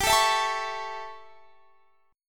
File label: A 7th G, chord